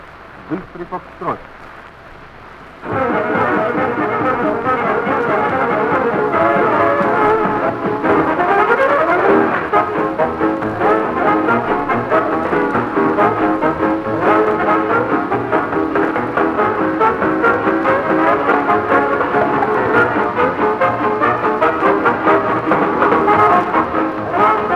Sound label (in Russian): zvuki dzhaza bistrii fokstrot